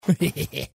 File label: laugh; assassinm4a1